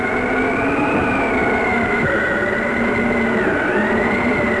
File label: wind; predator